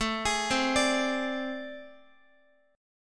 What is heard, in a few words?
A Minor Major 11th, down, Guitar; AmM11 arpeggiated; JGuitar